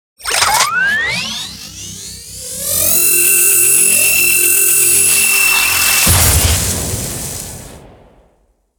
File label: Plasma Gun - overcharge; Warhammer 40000; Tabletop; munitorum Volume I Sound Effects